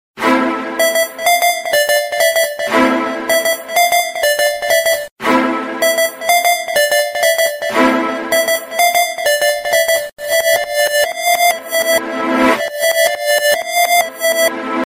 wonderful tune ringtone

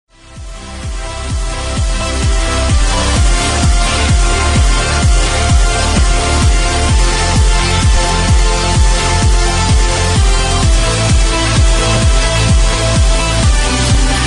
hiphop ringtone

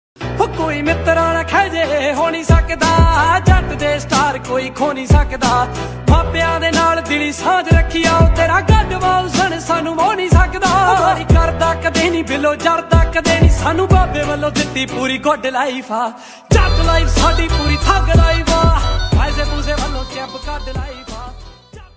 punjabi ringtone

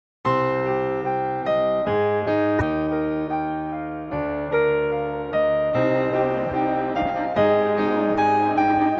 iphone 7 ringtone mix version

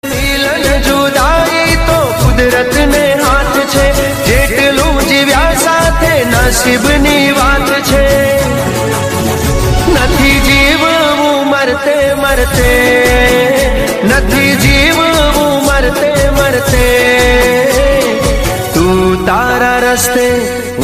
gujarati love ringtone

